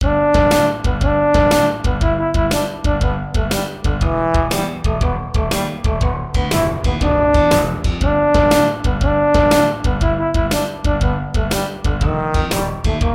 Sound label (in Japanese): torombone uppun, ソロ; ちょっとイキったトロンボーンが肩をいからせ歩きます。; ショートループ